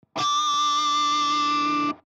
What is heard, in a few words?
pinch harmonic